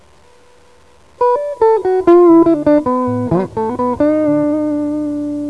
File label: riff